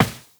Step Glass, Footsteps, Walk; Warframe; Tenno

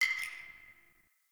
Ceramic Brick Hit; Warframe; Nineteen Ninety Nine

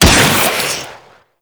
Infested Beam Pistol Shot; Weapons

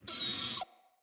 Wolf Cub Trapped Call Close; Animals; Wolf Cubs; Orengal Wolf Cub C

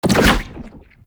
Mindhack Whip; Warframe; Infested Lich; Abilities; Zeke

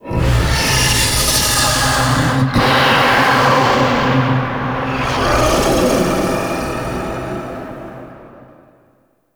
Breathy Vocal; Ambience; Warframe; Man In The Wall

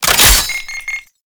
Bayonet Reload; Warframe; Tenno

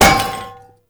Cannister Bounce; Enemies; Abilities; Warframe; Tau; Dax Archer

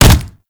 Generic Hit; Warframe; Characters; Tenno

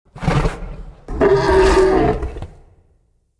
Stag Vocal Pain Idle; Animals

